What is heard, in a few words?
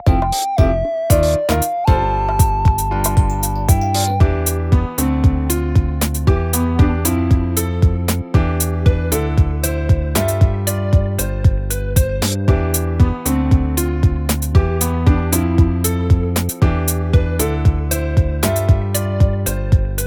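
BGM Light Board Result Lose; clubhouse games 51 worldwide classics 2020 switch gamerip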